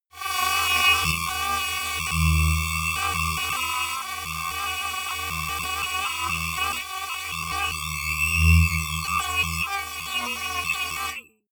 disturbing phaser; flaechen

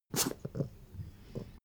pug gag silent; natur-tiere; hunde

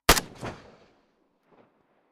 rifle ak-47 exterior fire single close; comedy-cartoon; Pistols and guns; pistolen-gewehre

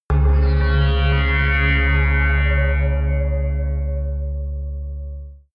whistle synth, drones

